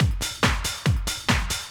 Loops BB 140, BreakBeats; Vengeance Samples

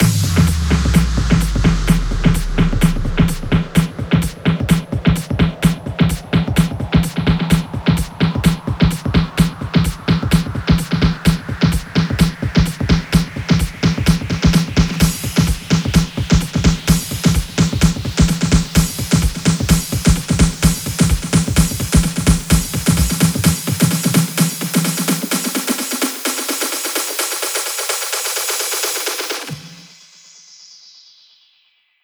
128BPM, House Electro Fills; Spaceman 2; Vengeance Samples

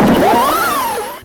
FX Scratching; Vengeance Samples

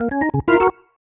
organ D#, vocal house

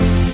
Synths F; Vengeance Samples

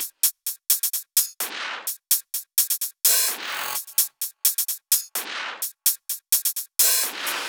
128BPM Kit; Vengeance Samples; Morpheus Kit

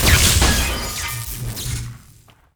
FX Impact; Vengeance Samples

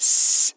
Open Hihat; Vengeance Samples; VEE Cymbals

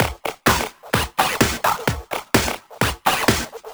VFX2 Loop 128 BPM

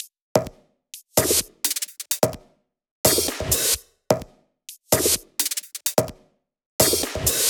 128BPM Fragile Kit; Vengeance Samples

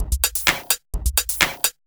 Electro Loop 128 BPM; Vengeance Samples

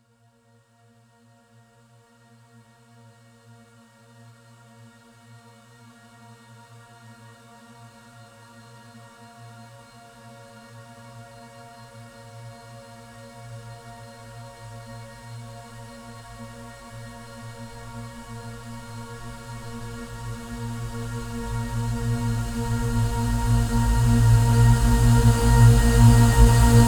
140BPM Vox A#, Melody Fills; 16Bars; Cult; Vengeance Samples

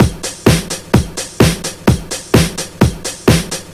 House Beat, 128 BPM

